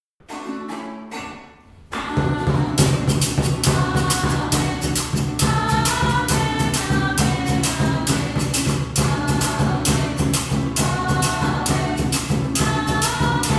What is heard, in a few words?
amen